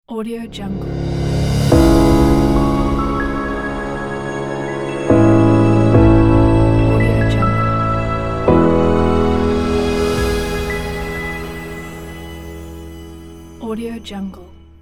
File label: romantic piano logo